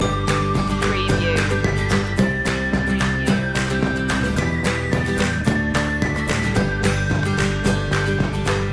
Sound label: happy as folk loop